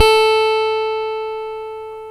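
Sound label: Chapman Stick, bass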